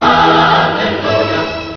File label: hallelujah